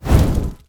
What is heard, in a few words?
Dash